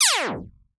synth laser; retro synth SFX

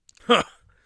amused, Warrior Voice; Warrior Voice Pack

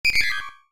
retro beep; sci-fi sfx

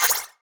weird, sfx

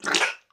slime; creature

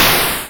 explosion, Retro Sounds 32-Bit

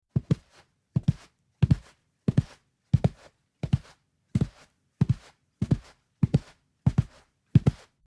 Carpet Footstep; horror; horror sfx vol 1

